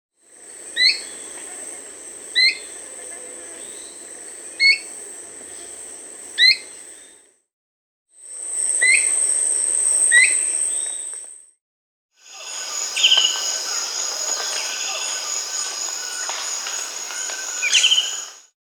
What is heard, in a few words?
Slender-footed Tyrannulet (Zimmerius gracilipes), Peru; S. America